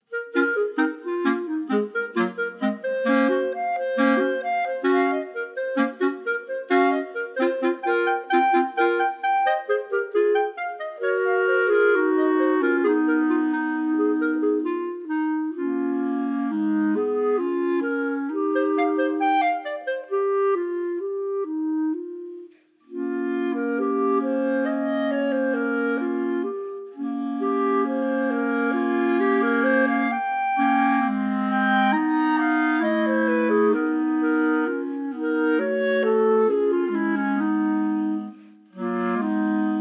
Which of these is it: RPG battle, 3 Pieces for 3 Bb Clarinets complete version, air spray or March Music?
3 Pieces for 3 Bb Clarinets complete version